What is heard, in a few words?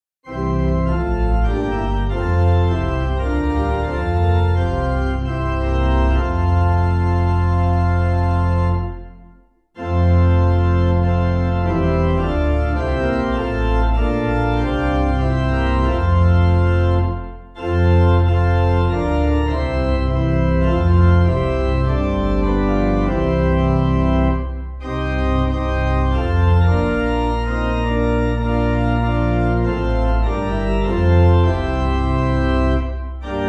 organ, hymn; song1; Hymnal 1982